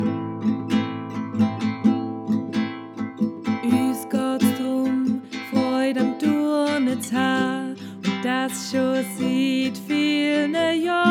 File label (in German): Turnerinnensong